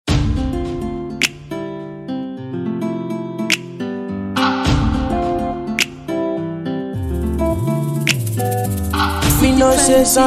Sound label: Freestyle